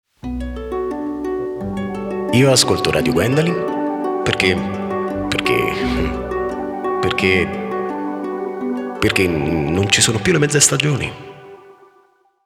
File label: Jingle